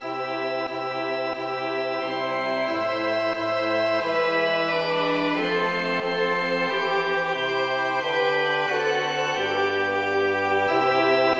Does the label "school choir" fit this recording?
No